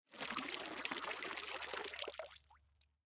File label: defeat kappa waterpour; smite; kuzenbo